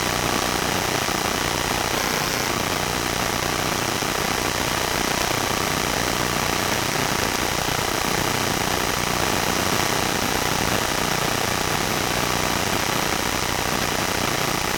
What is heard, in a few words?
Electromagnetic Fields Desktop Computer; Soundbox Library; Electromagnetic Fields Collection